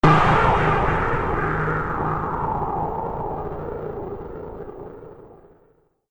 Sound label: Future Wind Explosion, battle sound effect; Andrew Fleming